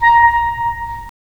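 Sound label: wind clarinet; Roland - LA Composer vol. 3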